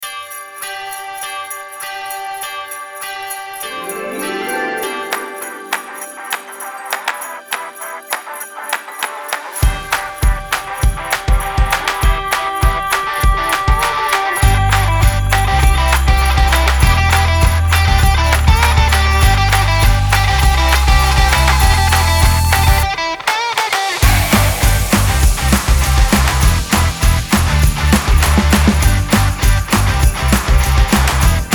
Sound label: christmas rock; Christmas Pre-Service